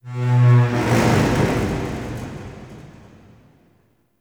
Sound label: Zariman Metal Rumbles; Warframe; The New War